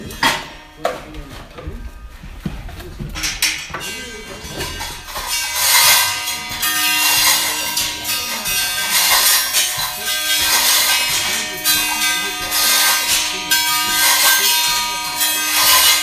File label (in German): Glocken